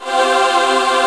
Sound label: CHOIR; Descent